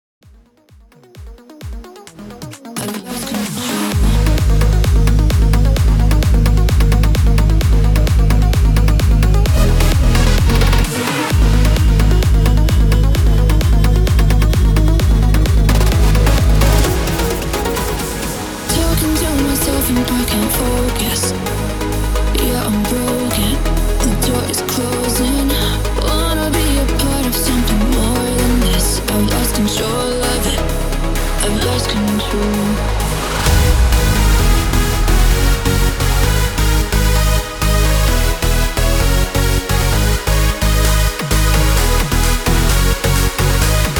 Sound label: 130 BPM